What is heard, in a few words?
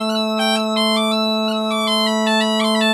AMB PA, BAGPIP; USB Soundscan vol.42; AKAI